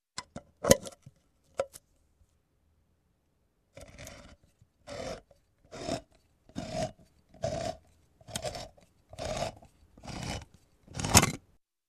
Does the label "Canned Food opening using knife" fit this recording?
Yes